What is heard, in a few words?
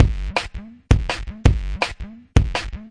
live distorto space, drum loops and breaks, 165 bpm; 161 to 180 bpm